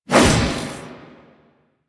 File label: Whoosh B; Tatsu Prime; Revenant Nikana